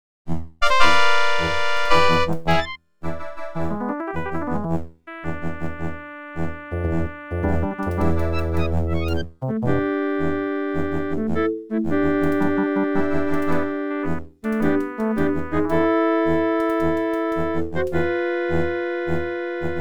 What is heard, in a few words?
Register; Star Wars X-Wing 1993